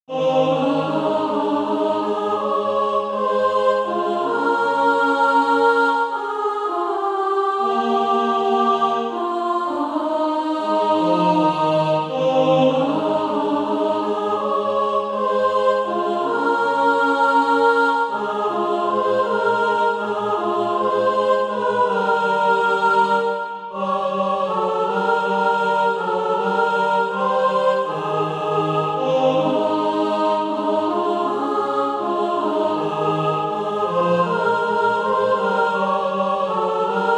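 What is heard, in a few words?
tenor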